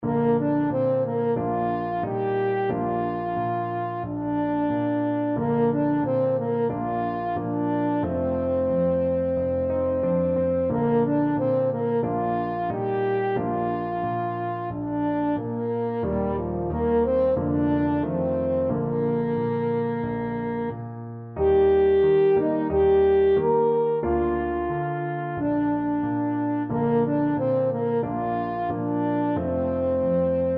skinner cradle, french horn

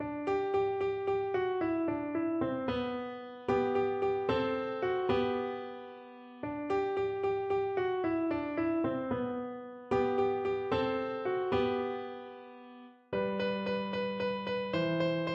easy piano

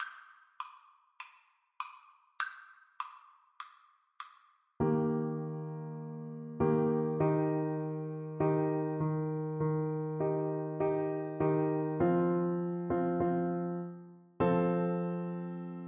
locus iste, French Horn

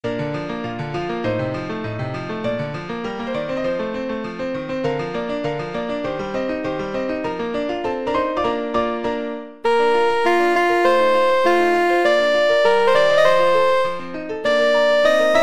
soprano saxophone; mozart voi

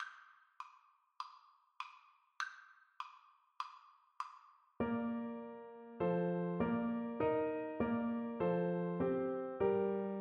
es ist ein ros, flute

